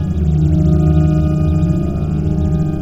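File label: Ambience Sci-fi Energy Loop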